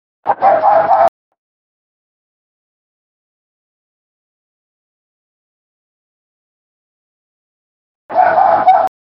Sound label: Gray Fox Bark